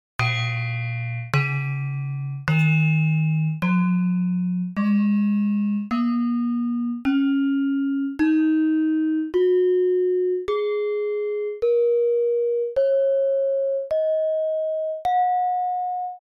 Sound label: gender barung slendro